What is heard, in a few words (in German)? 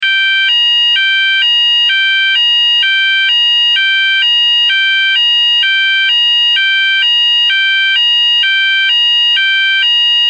Ton